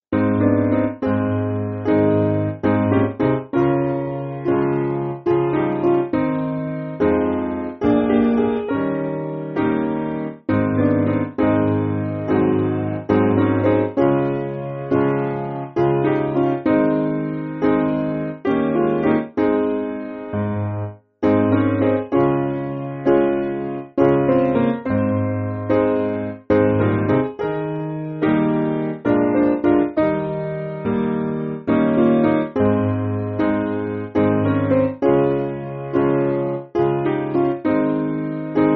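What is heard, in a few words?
Piano, Backing Files for Churches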